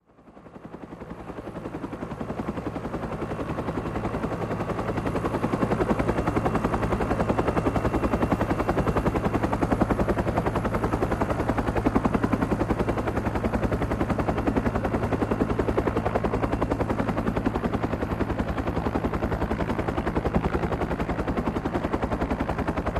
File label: UH-1 Huey: In Flight. Good Prop Definition. Interior / Exterior Use